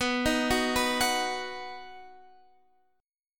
B Major, guitar chord

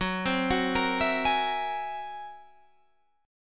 Gb 9th Flat 5th Gb down, chord; Gb9b5; arpeggiated; JGuitar

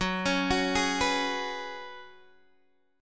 Gb Suspended 2nd Suspended 4th, Guitar, Open G; x,11,11,11,9,9; down; chord shape

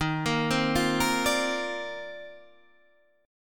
C Minor 11th D sharp

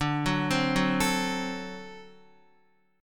D 7th, Guitar; D7 arpeggiated; x,5,6,5,6,5

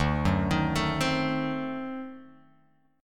D 7th Flat 9th D down Guitar Open F; D7b9 arpeggiated; JGuitar

